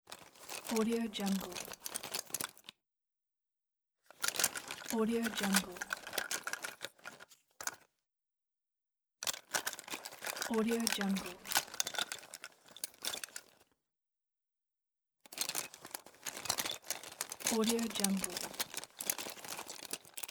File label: searching through pencil case